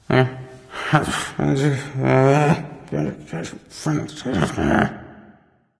grumbling; erlangshen

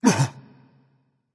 surprised berserker; cuchulainn

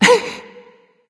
yay; smite; artio

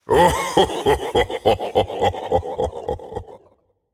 laugh; Smite; atlas

